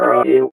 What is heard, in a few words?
Ghoul Voice; enemies; unsighted